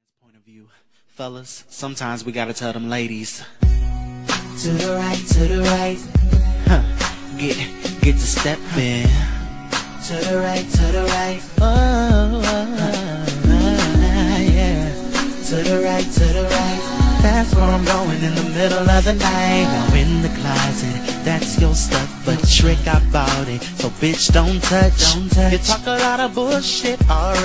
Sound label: male version